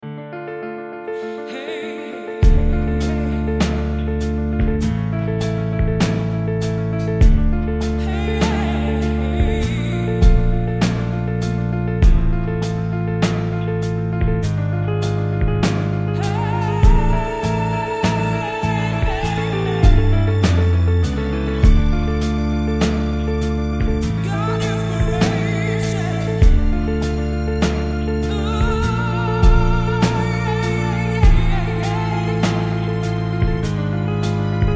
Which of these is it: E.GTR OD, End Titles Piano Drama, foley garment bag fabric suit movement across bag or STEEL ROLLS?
End Titles Piano Drama